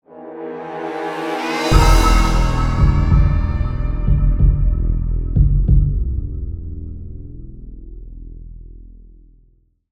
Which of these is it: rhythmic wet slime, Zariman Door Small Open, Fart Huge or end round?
end round